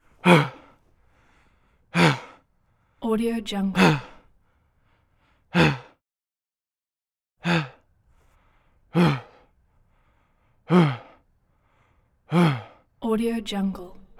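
male vocal very close up heavy breaths young adult; prosoundfx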